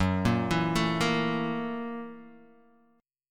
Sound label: Gb 7th Sharp 9th Gb Guitar Half Step Down